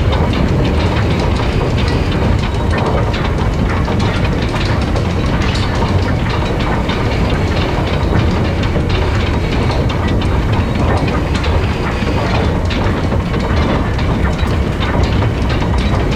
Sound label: Weight Loop; moul-assets